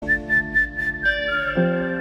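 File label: hello sms with whistle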